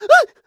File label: lava vocal; Scrat